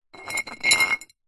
foley glass bottle 1 ltr movement slightly gritty tiled floor